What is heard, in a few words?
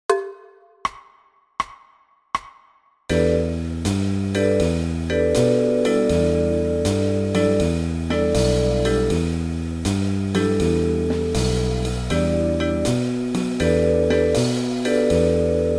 Em7 75bpm